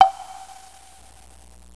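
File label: wood block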